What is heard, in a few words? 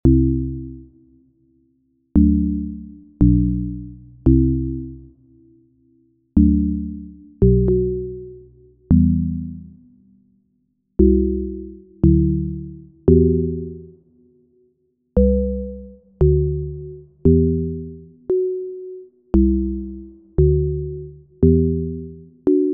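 CHORD PROGRESSIONS Trap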